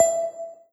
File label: trigger